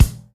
old school raw kick single hit a sharp key, bass drum sound tuned to the A# n